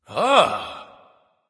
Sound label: "ah", discover; smite; voicepack; ullr